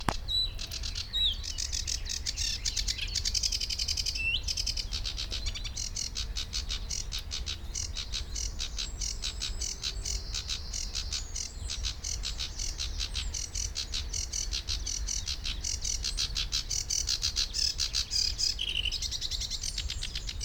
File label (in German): Schilfrohrsaenger; Ammersee; Christian Haass